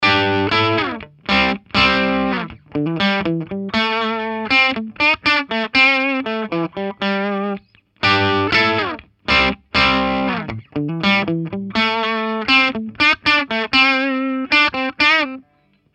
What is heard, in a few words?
two notes torpedo reload reamp miced clean amp clean